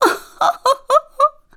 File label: Gift Laugh; Oren giving Link a gift